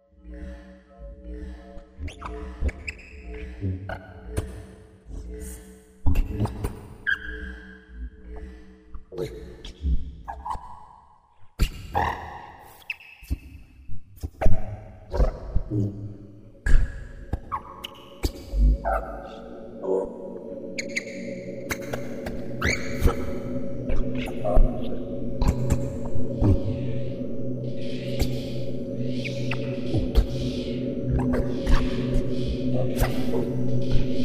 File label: Voice Abstract